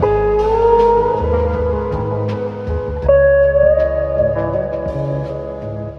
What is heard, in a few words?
guitar saddest country; Loops